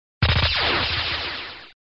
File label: Sound SFX, flipper